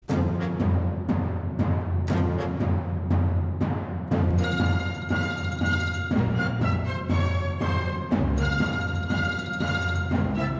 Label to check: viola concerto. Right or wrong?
Right